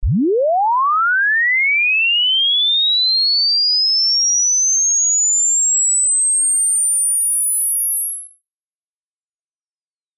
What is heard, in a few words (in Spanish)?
barrido 20hz 20khz